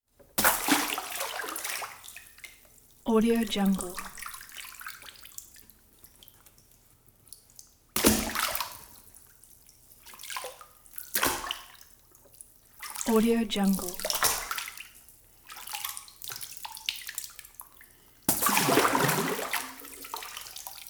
water movement

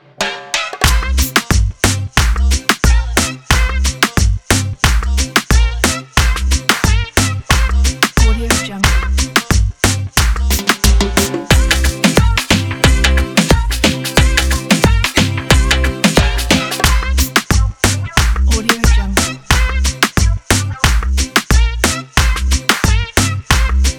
pop reggaeton

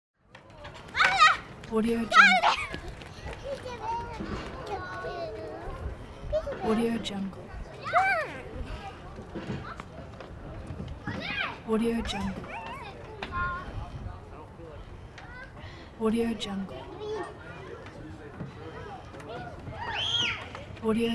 children playing in park; applehillstudios

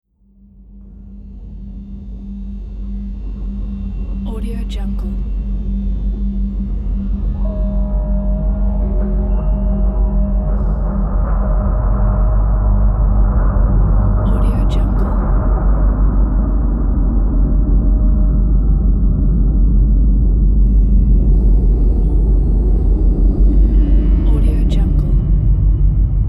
dark ambience

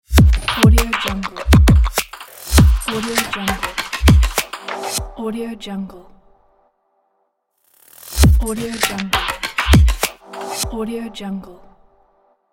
the modern logo

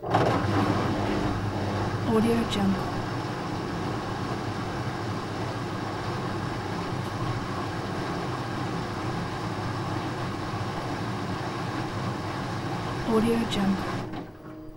washing machine

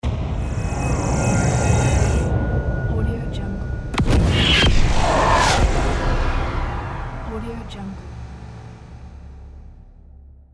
arcane dark magic spell

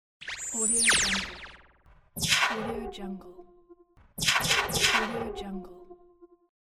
laser gun

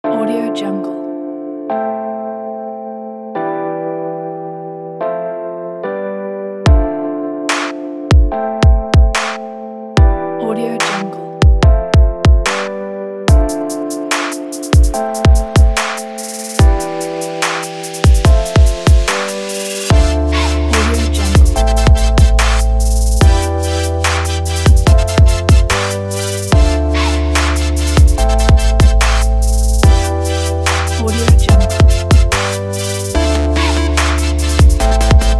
the future bass

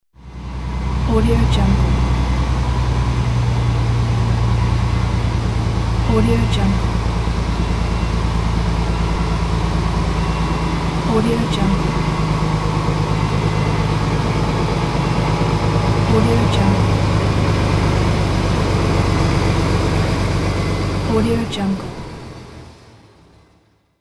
truck reversing

